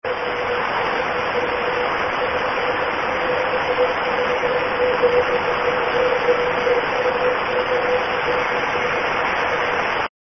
PSK31 435mhz300; AO-51

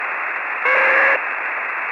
145mhz825; PSAT

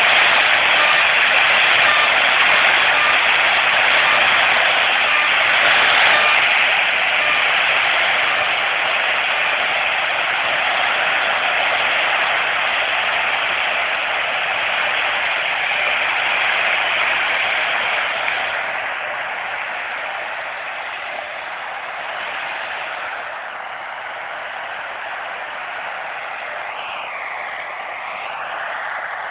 Dragon AM UHF Yagi; Orbit-29; Chelmsford MA

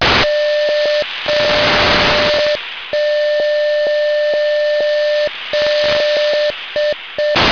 beacon; beesat

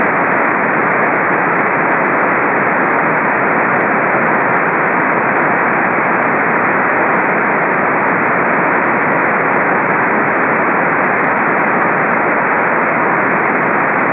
grail-B-sband

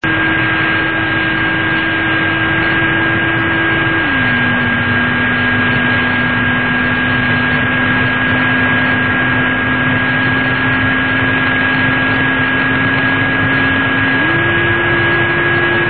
ERS 15 AM and CW MODE; 29 OCT 1974

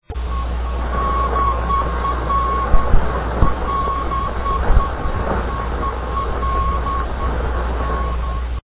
sputnik II

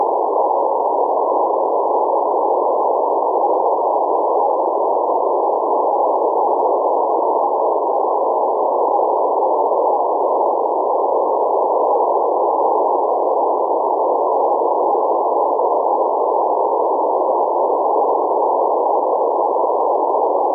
sideband

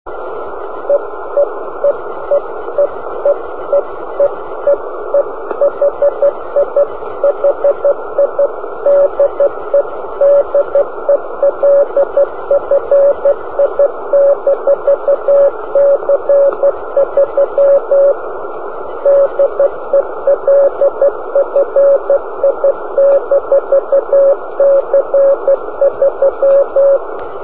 cw beacon; delfi-c3; pa3guo; 145870

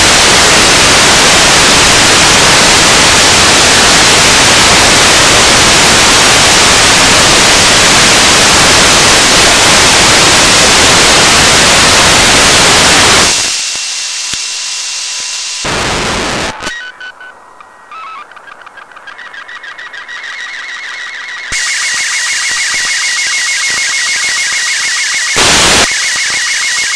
sstv and msg; Arissat

